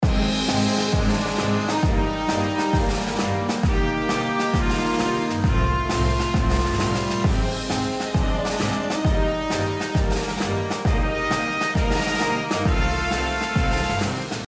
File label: Win Loop; All Guns On Deck